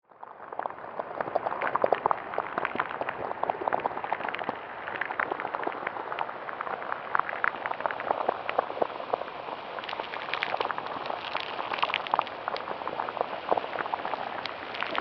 Bed Crackling, Eerie, Production Element; Imaging Element, Accent, Transition

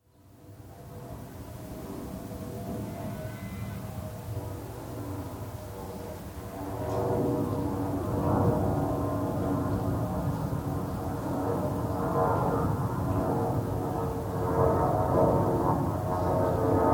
Jet Airbus Over Long Shot, city ambient; Jet Airbus Over Medium Long Shot; Cities

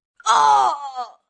Pain Ohhh, Grunt, Impact, 1 child, quick

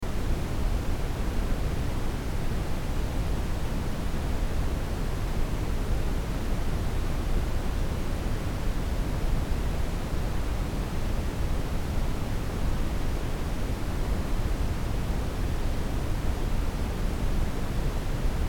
Test Tone Brown Noise -12Db Loop; 60 Sec; Blastwave

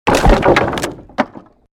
created wooden barrier smash medium

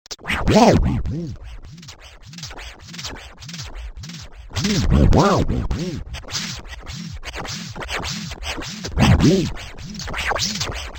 Broken Down Tape Recording; Tape Player Sound Effects

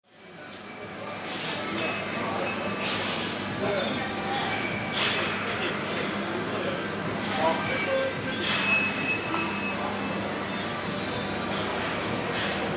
Arcade Hall, Amusement Center, dense crow; many people playing video games, billiards, shooting ga; Arcade Hall 1; Arcade Sound Effects

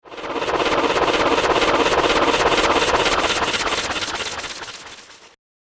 Little Dead Creatures, monster sound effect; horror sound effect

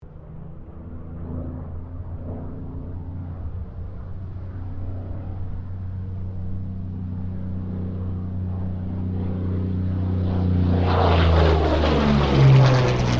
AIRCRAFT P-40 KITTYHAWK PASS STEREO

